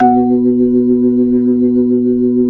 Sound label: B-3, Jazz